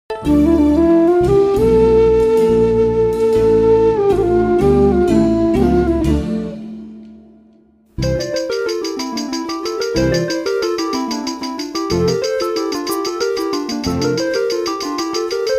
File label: bgm